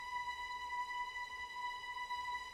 trem string; ice age scrats nutty adventure; Sky Diving

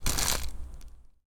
Bouquet

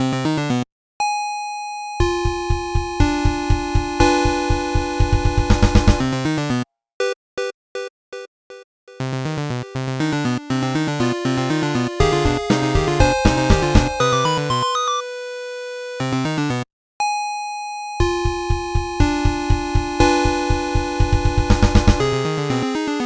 Dungeon; dragon prana

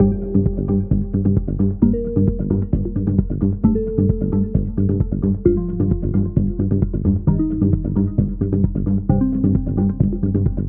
Panic Loop Filter; pang adventures

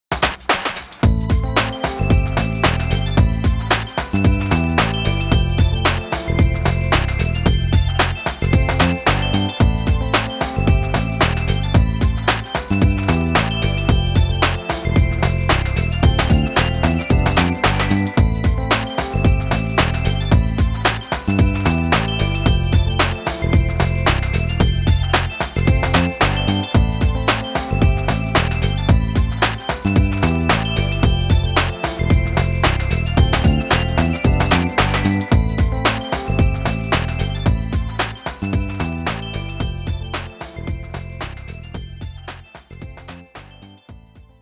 Normal jingle; Devil Survivor Overclock

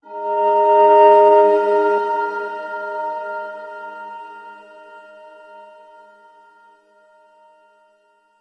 Backing

